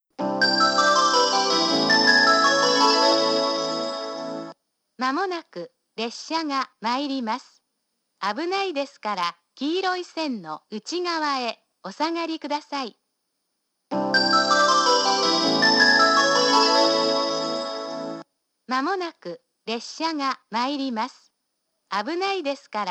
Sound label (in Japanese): 由布院, 下り列車接近メロディ＋放送（女声）